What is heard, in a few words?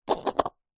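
sport golf ball knock into hole cup spin to stop; zapsplat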